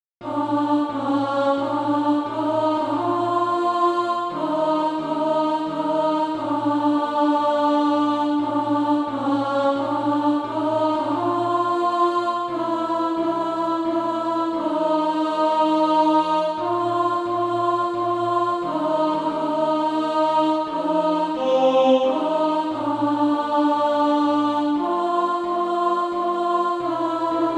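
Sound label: alto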